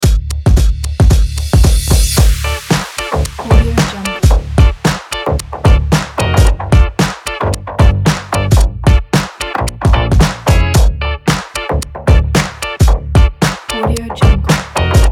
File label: funky breaks